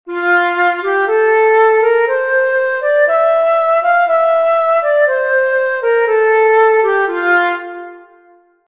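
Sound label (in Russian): gamma fa major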